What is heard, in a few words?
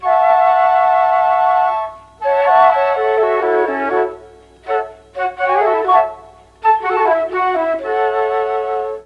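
E3 CHA CHA FLUTE, mellotron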